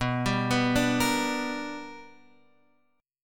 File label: B Minor Major 7th Sharp 5th Guitar Open F; arpeggiated; JGuitar